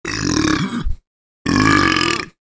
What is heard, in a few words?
double burp